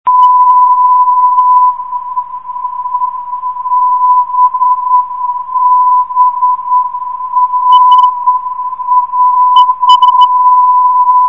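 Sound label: bbra 277.4khz, non-directional beacon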